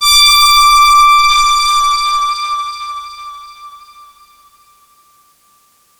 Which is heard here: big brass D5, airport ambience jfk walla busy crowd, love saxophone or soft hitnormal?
big brass D5